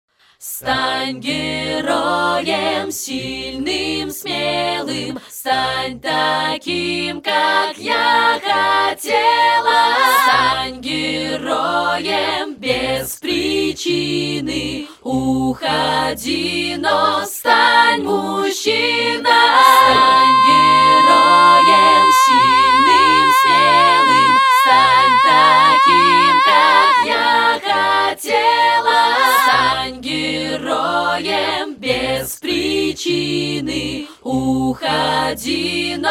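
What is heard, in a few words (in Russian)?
Бек-вокал Акапелла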